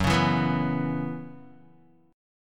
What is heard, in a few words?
Gb 7th Suspended 4th Gb down Guitar, chord